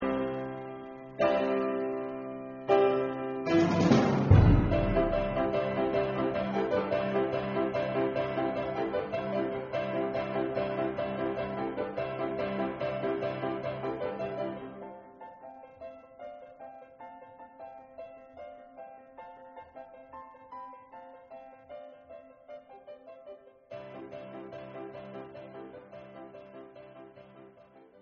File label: Tanz nur Klavier; carmina burana